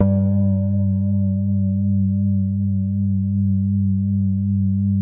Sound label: KOSM SOFT G2; Music Store - Claudius Diemeter Schwerlos; FINE ART 3